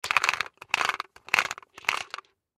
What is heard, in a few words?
Medicine Bottle Pills Cap Twist Open Push Down; Twists; Foley; Blastwave